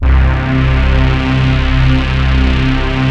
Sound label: DARK C2, STRING; FILM DARK; Q Up Arts; Dream Experience